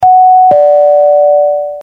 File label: bell